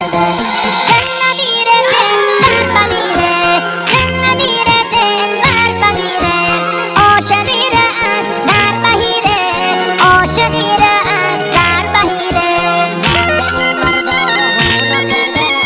mazandarani shoti lati

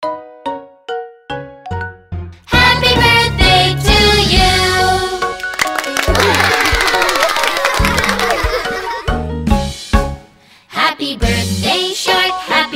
koodakaneh; tavalodet mobarak

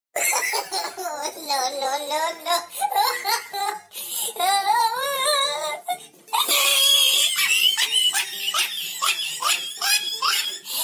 bache remix

